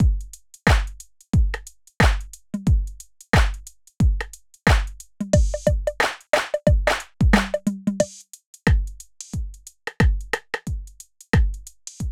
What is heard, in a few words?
Boom Beat